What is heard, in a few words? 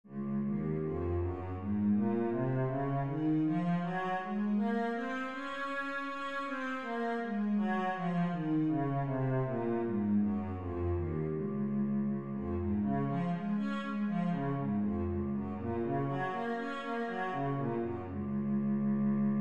cello, d flat major